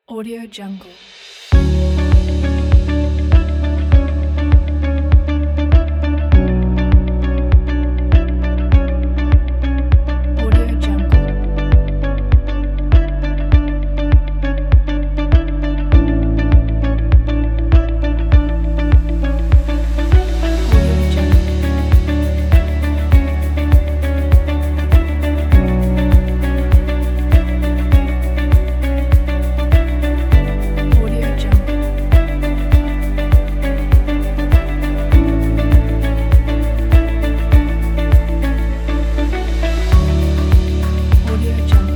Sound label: the ambient music